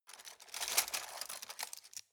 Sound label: cutlery plastic multiple drawer grab soft